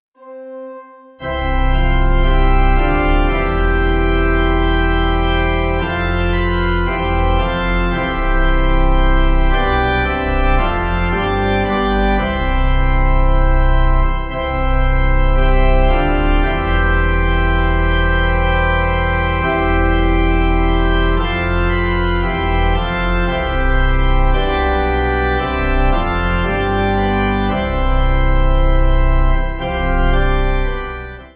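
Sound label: Pipe, Pipe Organ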